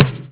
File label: boom